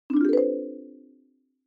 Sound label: Xylophone Fast Short Up